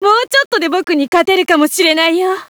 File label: cough; svencoop; player sounds; lappland